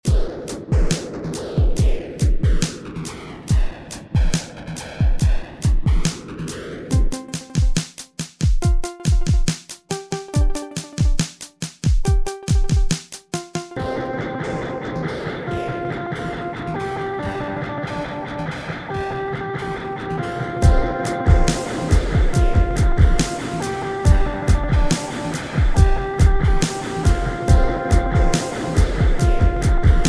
Dubstep rockin'

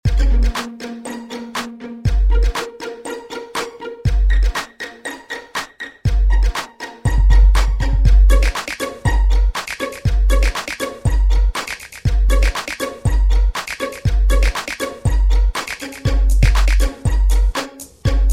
String beat; Beat Fixation

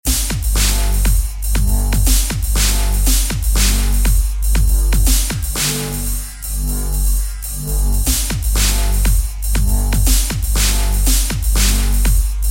Midway Beat

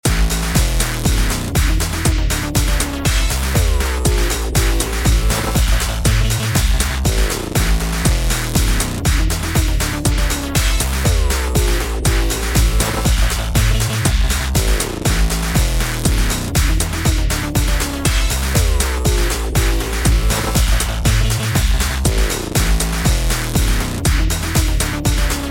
Choppy Waste East Dance Beat

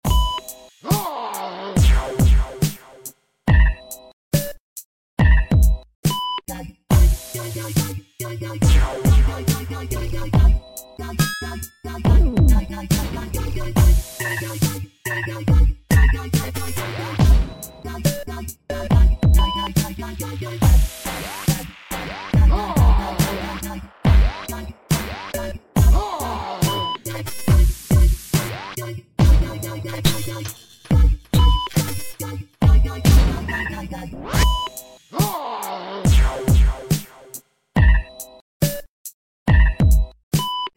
Dubstep

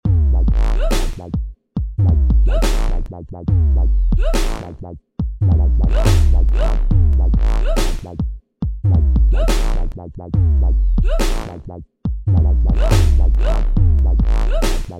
Throwaway beat

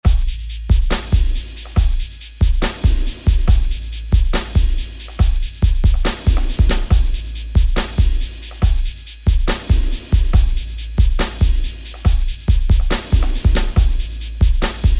beat; Flux

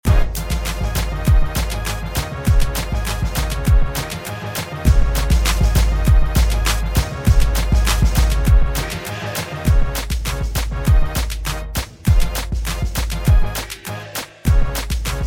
Beat this LOOP

